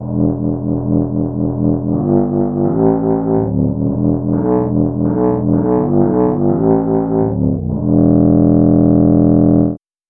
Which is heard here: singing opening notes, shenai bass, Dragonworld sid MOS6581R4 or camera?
shenai bass